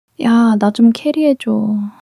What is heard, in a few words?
struggle